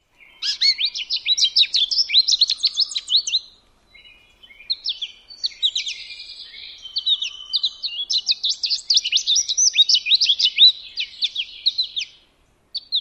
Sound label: song